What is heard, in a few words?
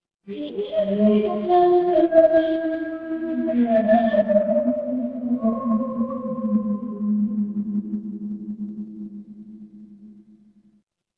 horror ambience dark